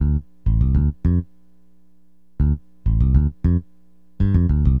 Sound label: BASS, Acid Jazz Funk